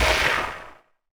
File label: X MACHINES, Production Effects; Best Service - XXL Production Effects